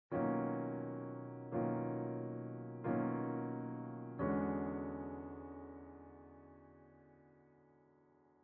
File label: Piano